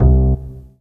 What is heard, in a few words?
C1 AC BASS; roland1